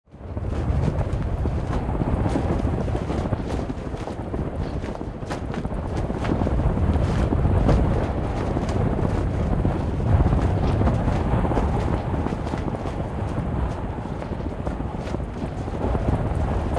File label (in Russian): zvuk razvevajuschegosja parusa